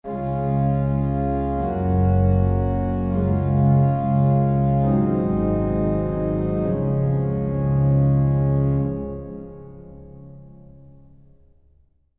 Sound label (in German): vergleich prinzipale